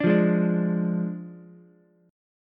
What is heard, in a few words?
F Minor 6th, Ukulele; JGuitar